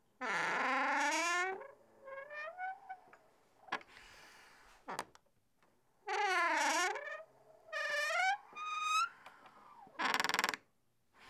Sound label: Door Wood Creak Open Slow